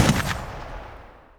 50cal ringout; WAR

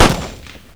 30cal; WAR

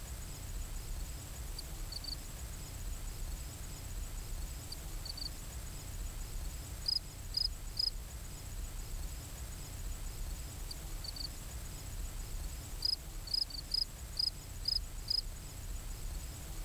grassfield; WAR

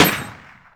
m1 garand; WAR

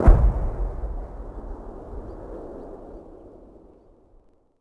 distant gun; WAR

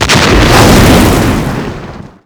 fuel drum explosion; WAR

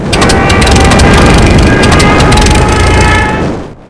henschel crash; WAR

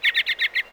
robin

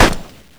30 cal; WAR

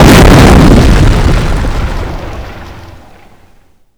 small explosion debris; WAR

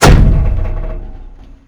avre shot churchill; WAR

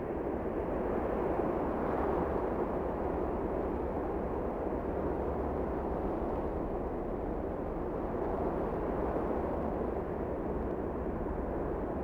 canyon wind; WAR

